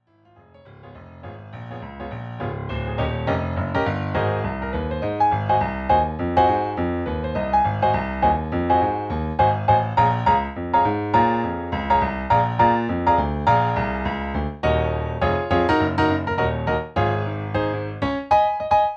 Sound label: piano